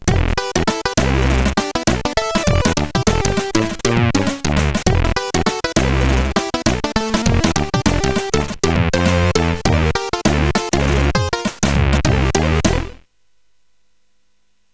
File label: Trakten, CSG8580R5